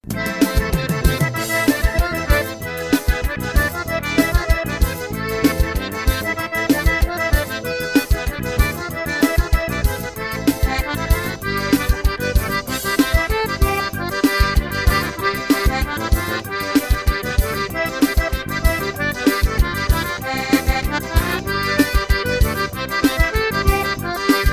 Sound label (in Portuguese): forro xoteado solo